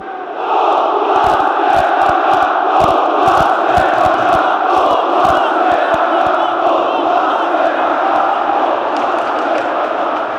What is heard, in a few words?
rapid wien chant